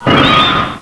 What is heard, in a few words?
Pulser; Swoop